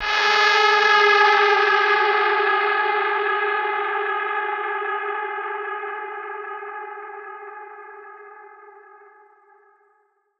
atmosphere G min

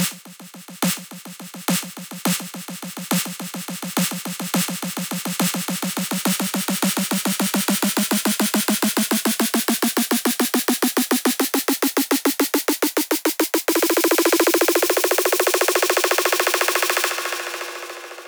Snare roll

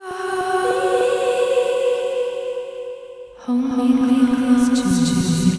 B Hold Me Verb Del Fx

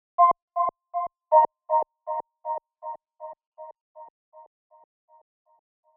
Synth Loops 119 C Fx Tails

